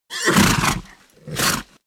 horse snort; Mount&Blade Warband

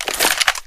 shotgun deploy; weapons

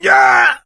hurt critical, survivor voice; Left 4 Dead 2; coach

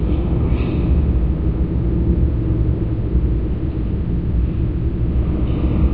ambience, atmosphere; Garry's Mod; hl2

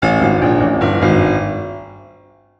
charger bacteria, music; Left 4 Dead 2

